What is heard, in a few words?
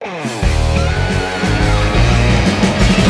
Boss Fight 177 Bpm Intro; We Happy Few